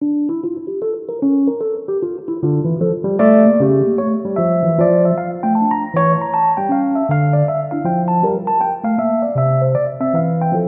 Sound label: Invention in D Minor Rhodes Version; Bach; BWV775